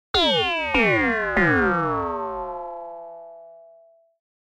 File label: odyssey notch effect; opxpro